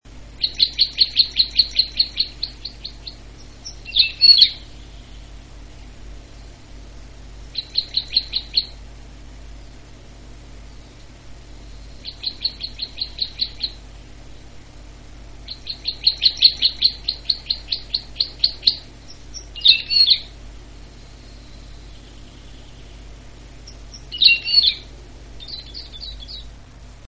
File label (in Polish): krzyżodziób świerkowy